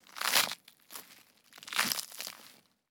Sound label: crunchy tear or rip